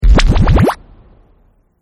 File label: round score healing up